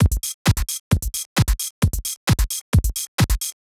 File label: STRIKE DRUM LOOP; Alien Imaging; STRIKE FX